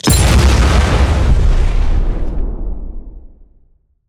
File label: explo mine; Explosions; PremiumBeat